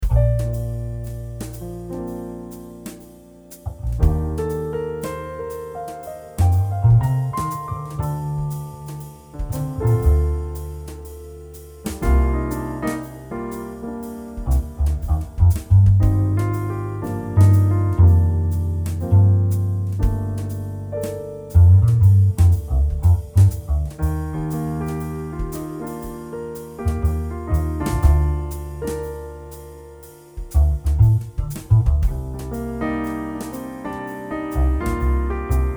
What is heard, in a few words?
jazz; jazzteq